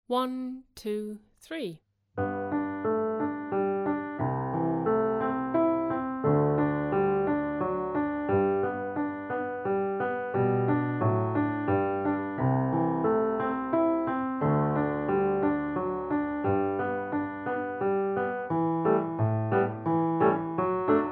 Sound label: Andante; Playalong